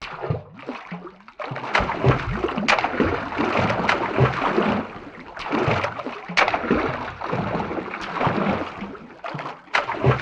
Underwater Water Object Movement Splash Gurgle Bubbles Footsteps Shallow